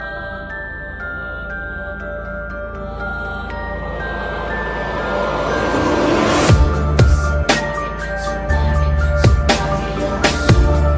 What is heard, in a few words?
slow love